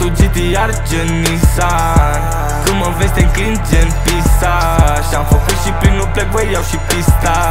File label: gtr; csco; csgo